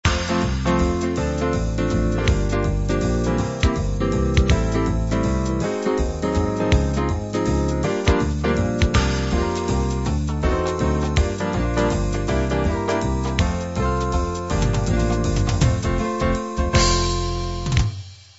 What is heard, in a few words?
jazz waltz